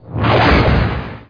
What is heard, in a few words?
x wing rotate; lego star wars